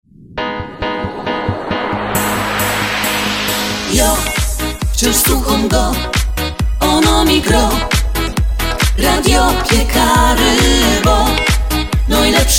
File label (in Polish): Jingiel; radio piekary wraz z rmf fm